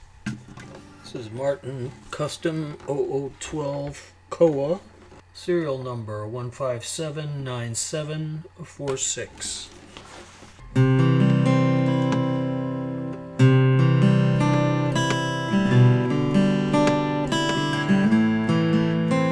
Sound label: martin custom 00 12 fret slothead